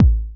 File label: bassdrum, drum samples